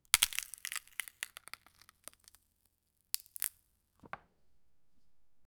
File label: sounds of breaking chocol